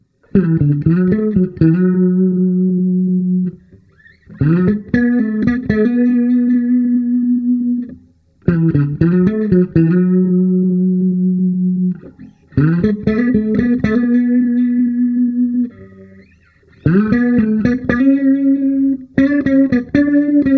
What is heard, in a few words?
AE 212 LEAD ONLY; berg ae112